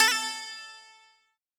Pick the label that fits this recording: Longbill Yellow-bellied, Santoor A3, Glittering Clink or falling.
Santoor A3